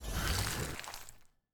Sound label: D M G Ice Light